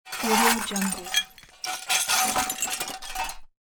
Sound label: shovel taking glass metal pieces